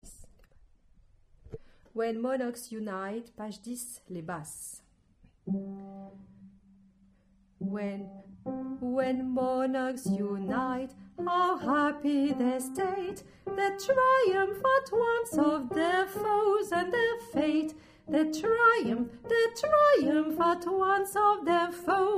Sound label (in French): basse